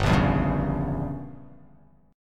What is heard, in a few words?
G Diminished 7th